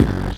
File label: Kick Beatbox